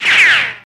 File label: Bullet Ricochet Ban, riccos